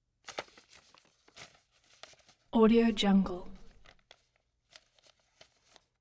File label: notepad handling